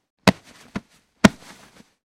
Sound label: Grabs Cloth Movement